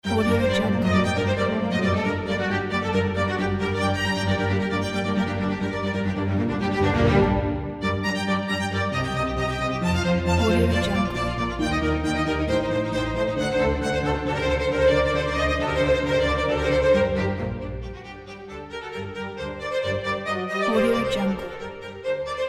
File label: concert for strings in g minor movement i; antonio vivaldi; allegro molto